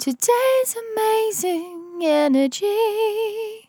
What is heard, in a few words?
On A Star 65bpm F, Vocal; Zero-G